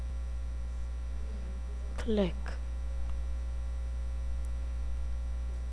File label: click